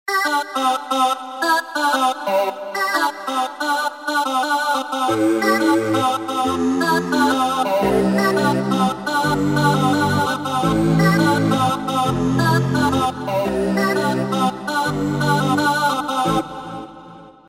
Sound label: Baby Dub